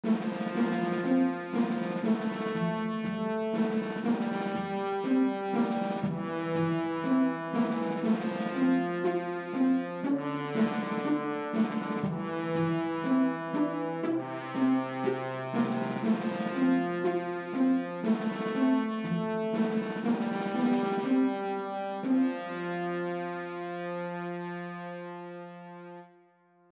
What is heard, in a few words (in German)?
Vierstimmig. Gemischte Gattung.